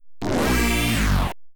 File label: Combo Fanfare; Getaway: The High Speed II; Williams; pinball; arcade